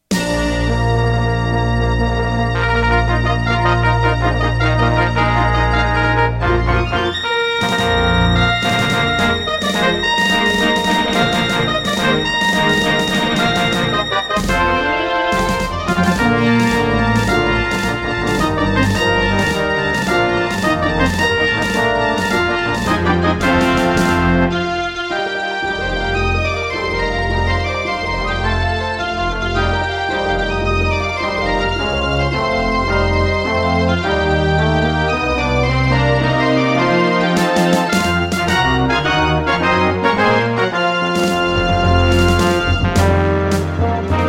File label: Intro, Serdaco Dreamblaster S1; Dark Forces